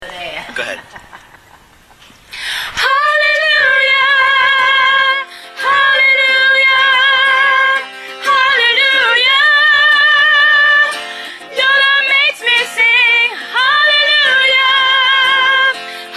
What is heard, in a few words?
hallelujah soprano